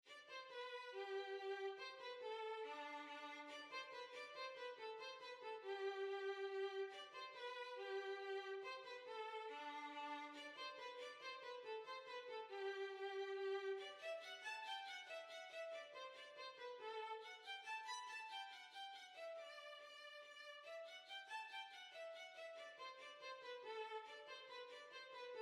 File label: Hornpipe, Violin